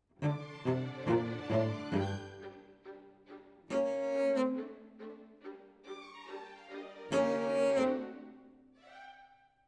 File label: Cello Solo